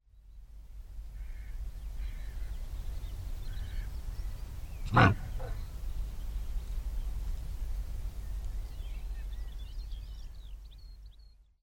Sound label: adult red deer alarm bark